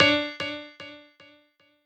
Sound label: piano sabi